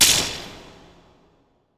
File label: combat hit